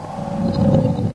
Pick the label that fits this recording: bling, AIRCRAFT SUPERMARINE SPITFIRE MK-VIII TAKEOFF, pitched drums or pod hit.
pod hit